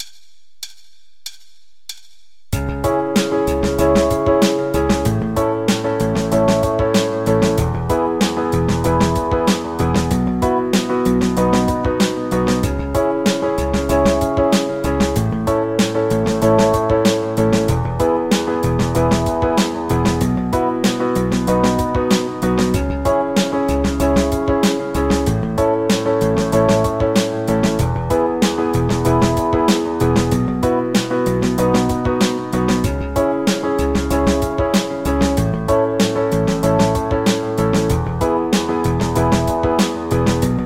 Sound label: Monday Morning Kanon Playback